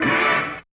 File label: Yamaha DJX 2B, Hit Brass